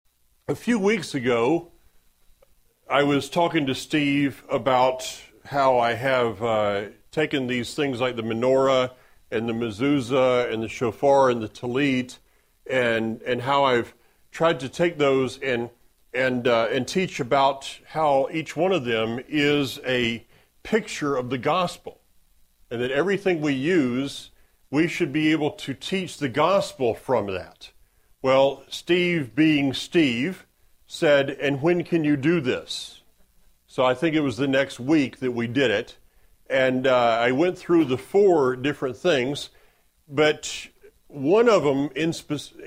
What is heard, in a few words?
Shofar